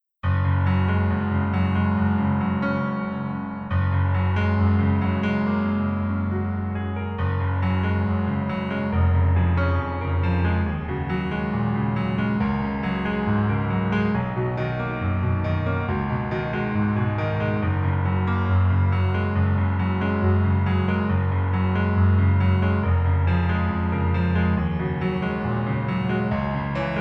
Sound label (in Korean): Piano ver, 멜로디MR, -1키; 낭만닥터 김사부